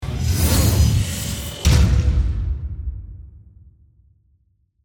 Mechanical Assembly, Servo, Motor, Whine, Transforming, Robot, Production Element, Imaging Element, Accent, Transition; Spacecraft Sound Effects; Blastwave